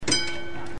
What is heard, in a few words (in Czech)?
zvonec starý; praha zastavky